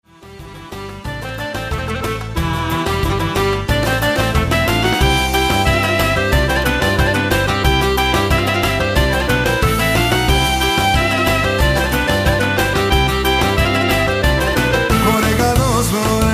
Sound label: Syrto